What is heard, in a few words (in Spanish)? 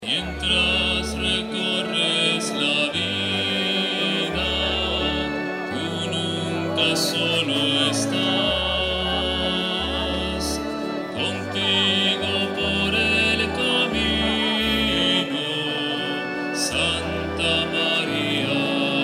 CANCIÓN MIENTRAS RECORRES LA VIDA EN MISA